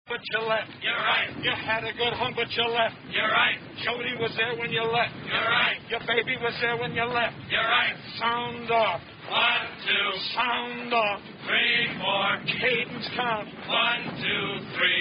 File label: Sound Off Chant; Sound Off (The Duckworth Chant); 1949; battleground